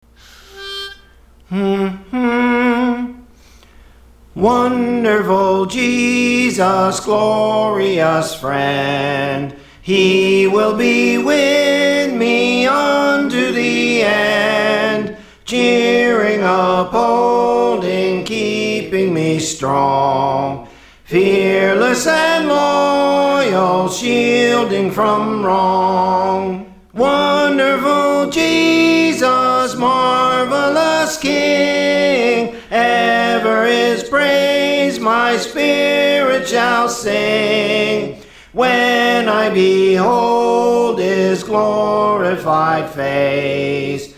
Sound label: Soprano; Praise For The Lord Training